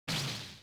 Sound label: fire frigate; fujitsu